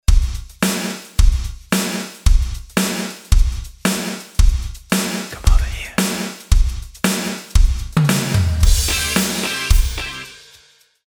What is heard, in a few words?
random kit; TD-50; Studio X